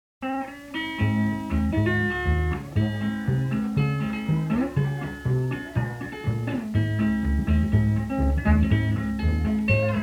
instrumental, home tape

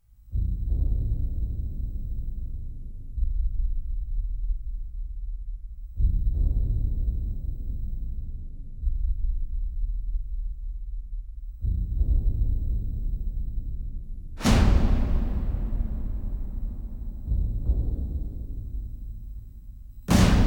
colossus drums

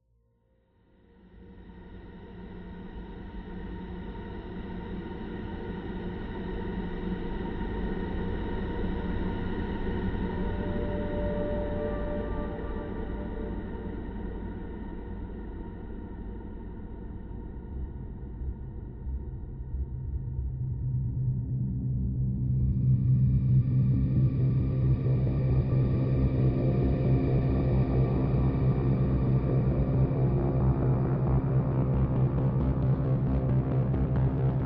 ambient

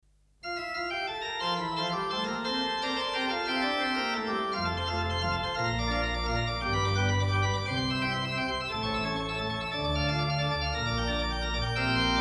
prelude in f major; johann sebastian bach; prelude and fugue; bwv 556

